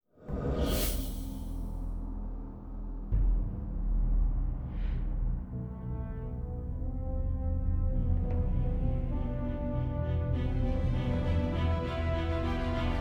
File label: Opening - Hebrew; Wakfu S1